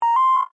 Device Beep, Scifi; Science, Fiction; Tech; BlastWave

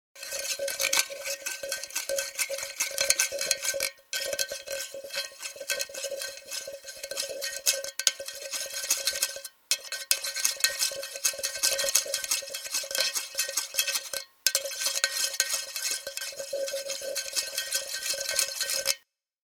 Stirring Pot, Stirring food in a pot.; Household

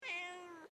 Animal Cat Tabby Meow

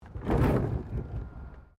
PLASTIC BARRICADE SLIDE; Material components for constructing more complex sounds

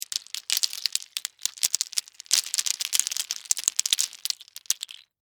Debris Mixed Nuts, Pile; Texture; BlastWave

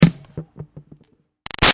Impact Pylon Plastic Big Hollow; Materials; Big Room Sound

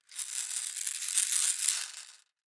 Baby Toy Beads Roll In Tube Hard; Miscellaneous Toy Sound Effects; FOLEY

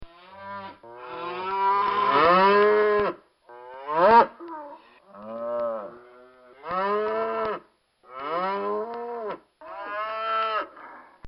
Herd Of Cattle

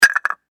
Foley Cap Set Down Plastic; Set Downs; SFX Bible 6

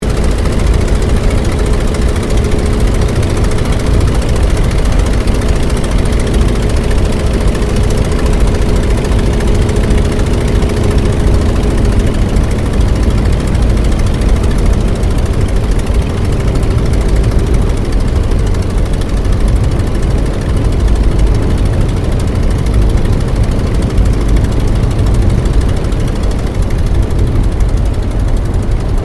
AIRCRAFT RYAN PT22 APPROACH INTERIOR; 1942; Airplanes; Vehicles

